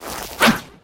attack

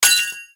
Crash Golden Jar; Chili

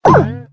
Transform Jump; Lime

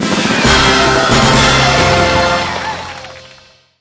User level up

